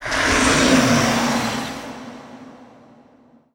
souls receive; Garry's Mod; tf2

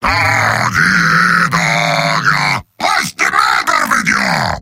heavy singing; mvm

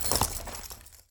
taunt equipment gun; Garry's Mod; tf2

